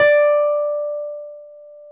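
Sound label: Wurlitzer electric piano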